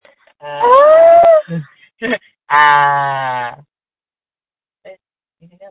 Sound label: Scream